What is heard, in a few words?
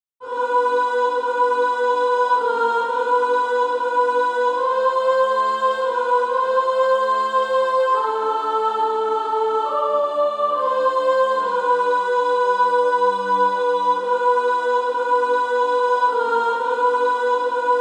Female Choir S1; SSAA